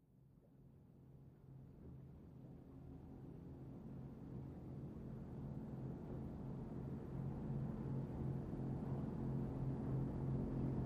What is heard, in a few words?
Military PBR Offshor